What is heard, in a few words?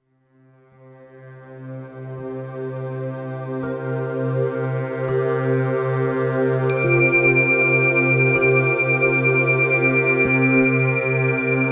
Sounding The Chakras Instrumental